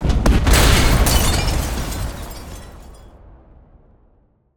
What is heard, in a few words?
Designed Car Explosion With Metal Breaking And Glass Shattering; DESTRCrsh; DavidDumais; Explosion SFX Pack